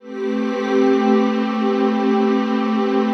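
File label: trance pad; ATMO PADS; Best Service; XX Large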